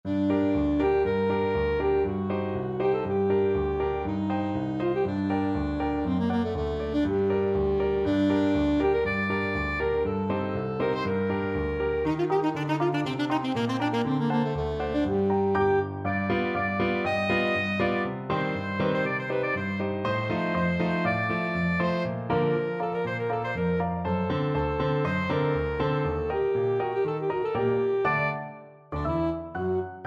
hungarian dance, saxophone

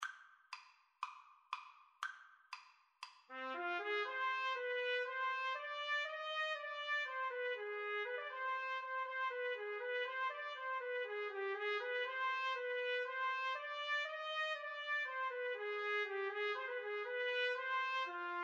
Odessa Bulgarish trumpet trombone

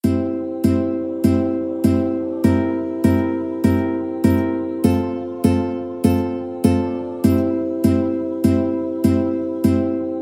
ukulele

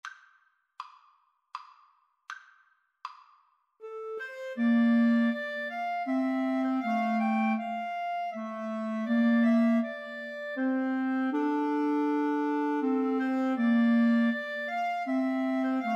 Clarinet Trio

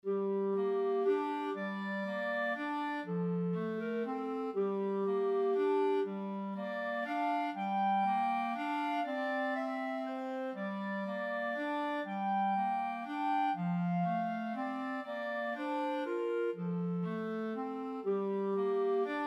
scarborough fair clarinet duet

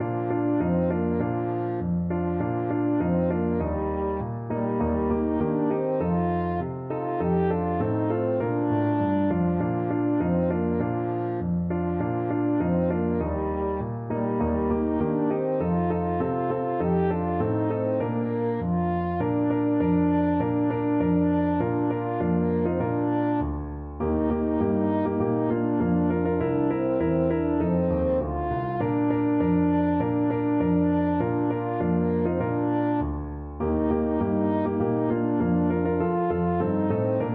jingle bells, french horn

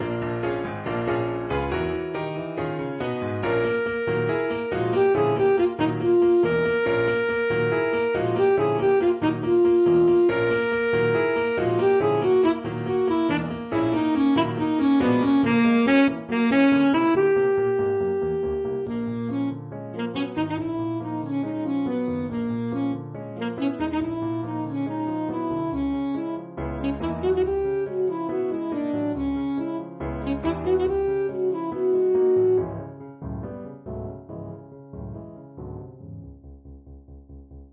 ASAX, saxophone

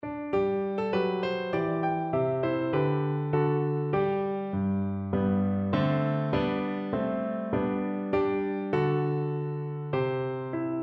roving viola

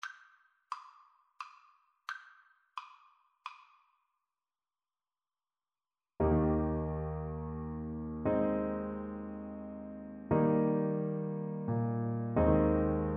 vieni sul mar, french horn

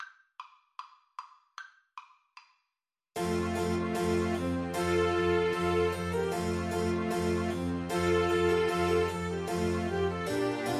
spring, Trumpet

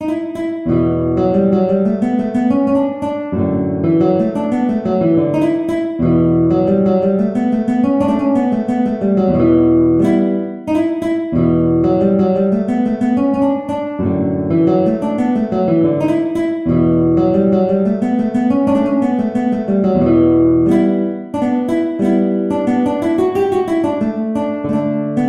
guitar

